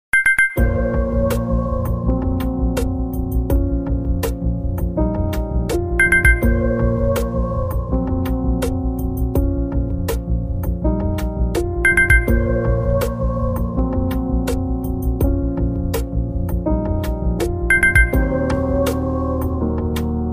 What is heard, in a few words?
htc one m9 plus, ringtone